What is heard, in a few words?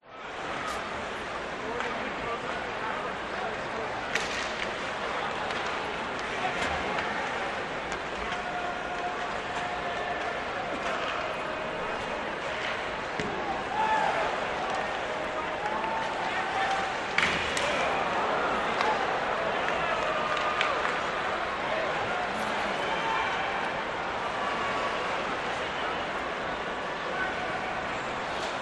ice hockey match crowd ambience game play